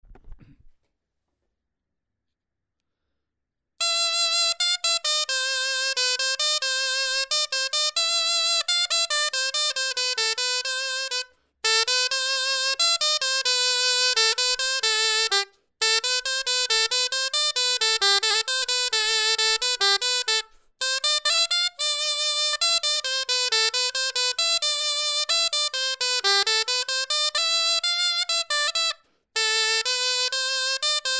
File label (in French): clarin etude